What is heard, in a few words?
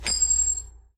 Coin; Gambit